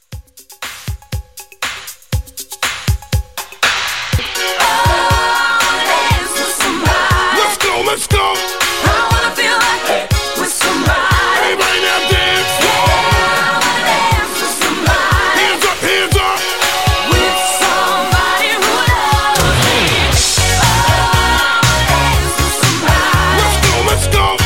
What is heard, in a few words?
80s Hype